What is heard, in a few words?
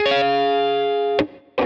Dbadd9 Eb Arpeggio down